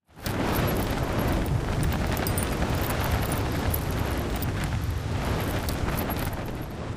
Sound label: atlas eject pilot descent foley longer; Titanfall 2